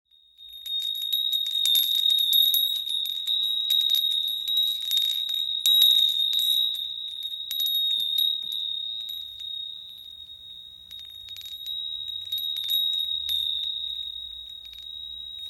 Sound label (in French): Carillon 3 clochettes